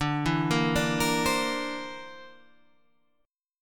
D 7th Suspended 2nd Sharp 5th D

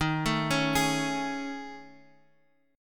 A Major 7th Flat 5th

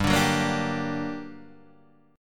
G Minor Major 7th, down, Guitar, Standard; GmM7 strummed; JGuitar

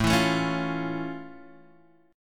F Augmented Major 7th, chord

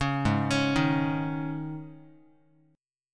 G sharp Suspended 4th Guitar chord, down; arpeggiated; 4,4,4,1,x,x; 4A,4E,5A,5D,5G#,6C#

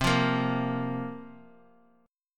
C 7th Suspended 4th, guitar; C7sus4 strummed

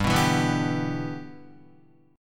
G 6th, Guitar; G6 strummed

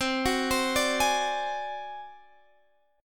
Ab 7th Flat 5th C, chord, guitar

